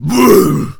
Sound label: attack, Zombies; zps; Z Punk